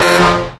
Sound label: fire horn; SHaR